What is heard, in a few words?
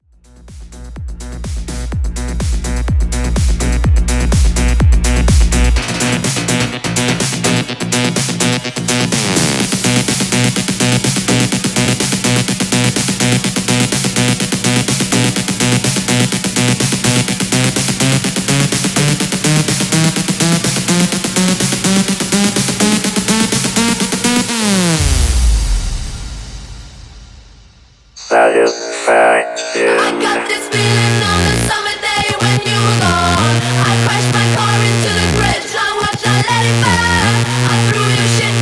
Mashup 125 Bpm